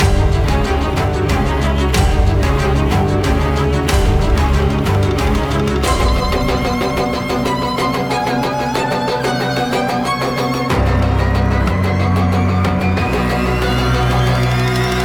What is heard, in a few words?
Combat